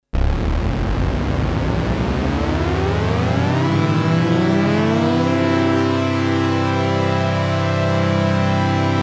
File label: portamento; obx